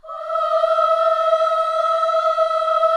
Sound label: KIDS CHOIR, Gospel